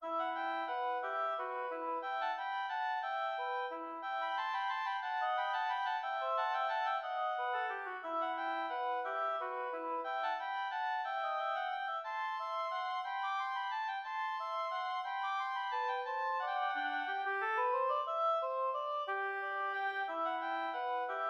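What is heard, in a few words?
duet 2 oboes; molter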